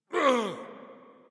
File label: grunt; Smite; bacchus